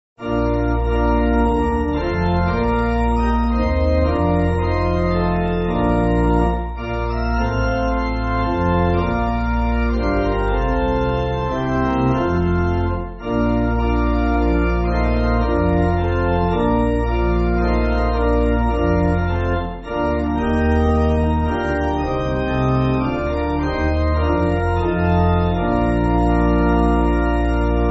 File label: Pipe Organ, Backing Files for Churches; The Gods Palace; Mozart; A.W. Mozart